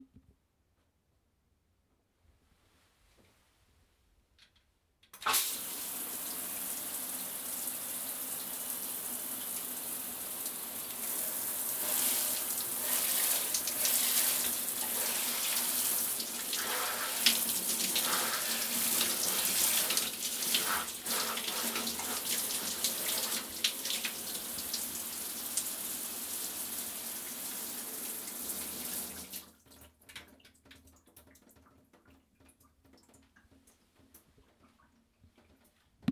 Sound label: Shower